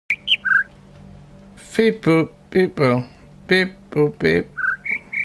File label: pipupipu